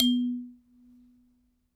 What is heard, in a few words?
Aluminium Tube Hit